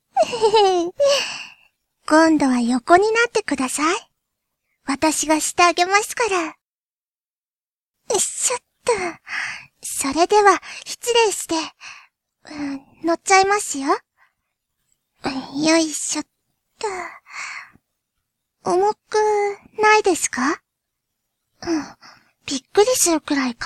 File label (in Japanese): 囁き耳舐め; 囁き耳舐め二回戦、でもやっぱり優勢なのは…